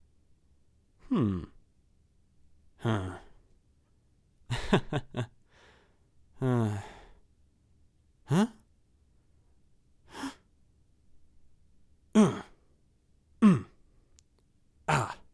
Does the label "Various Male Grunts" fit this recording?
Yes